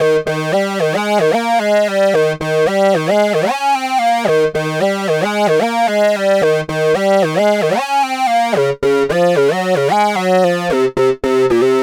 MOOG

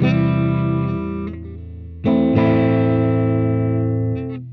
GTR

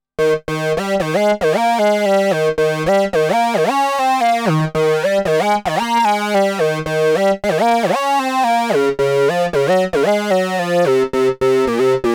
MOOG

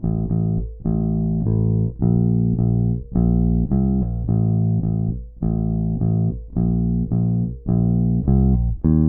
BASS

